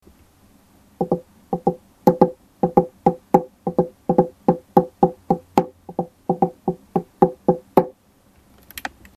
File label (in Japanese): pack kotudumi